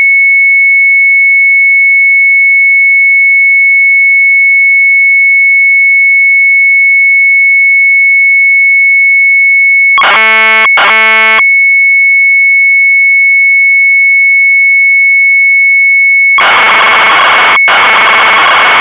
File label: MZ800 Tape; sharp; SINUS CURVE; retromelsarchive